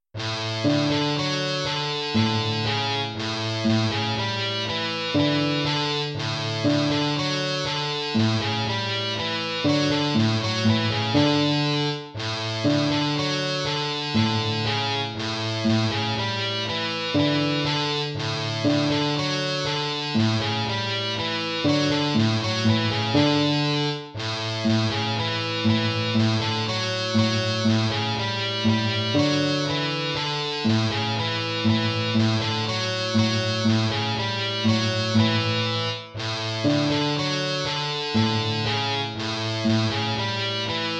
waltz; Carulli Ferdinando

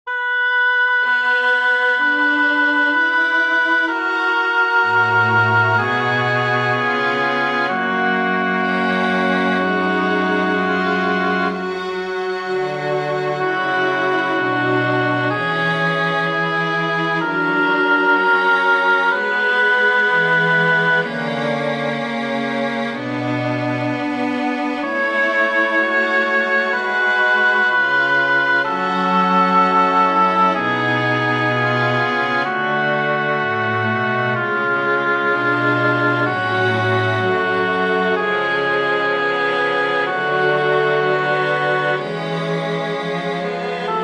prelude for oboe strings; Johann Sebastian Bach; ach gott und herr

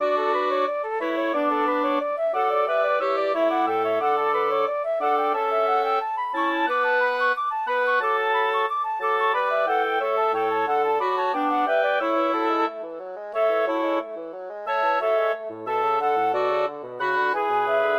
sinfonia for woodwind quartet; haendel georg friedrich; from giulio cesare

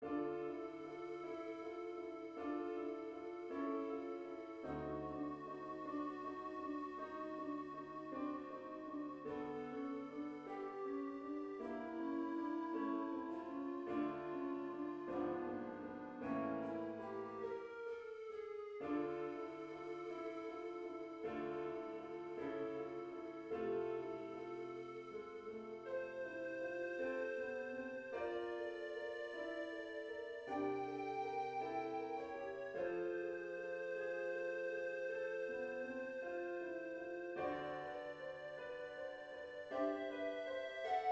song without words; rachmaninoff sergei

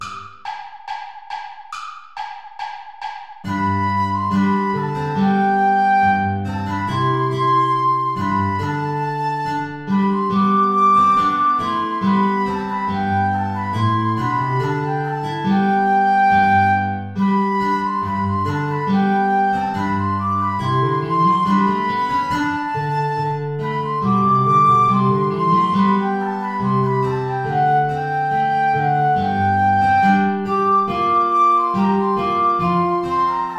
ballet play along without guitar

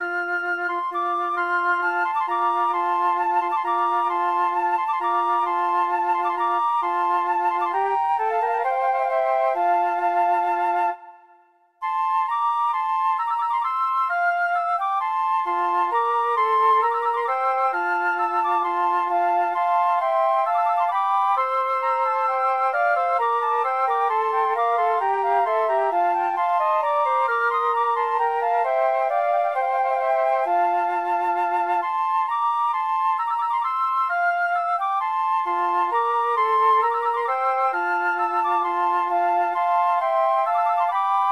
for flute trio; morley thomas; strike it up tabor

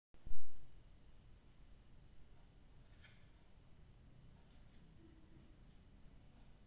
lute suite double; bwv 997

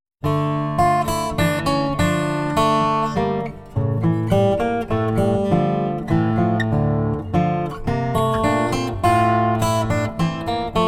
menuet in d minor; Johann Sebastian Bach

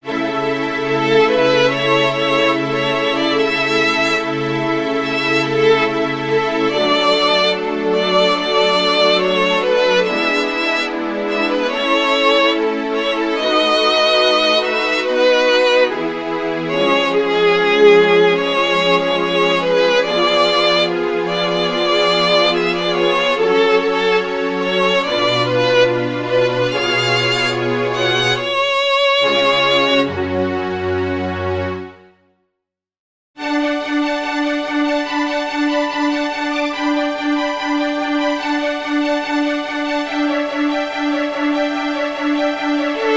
for violin, strings; And the angel said unto them

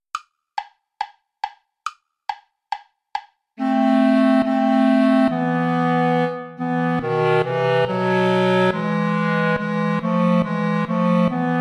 allemande play along without clarinet

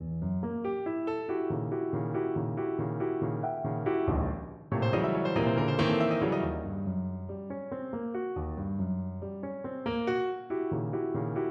piano

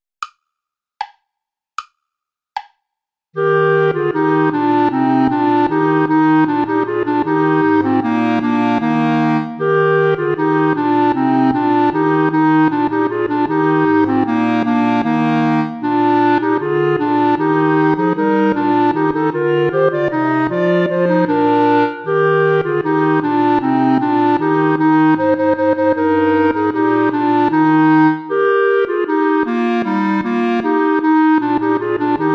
play along without clarinet; traditional

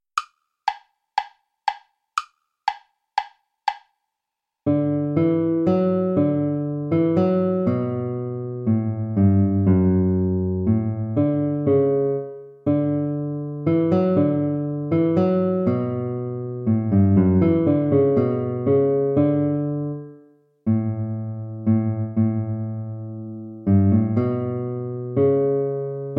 ode joy play along; beethoven ludwig van

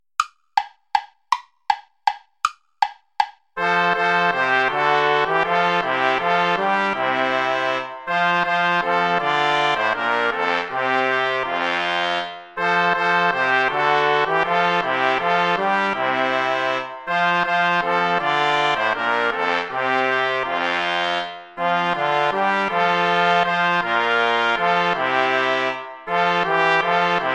play along without trombone; vulpius melchior; lobt gott den herrn ihr heiden all

